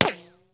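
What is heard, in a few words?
ricochet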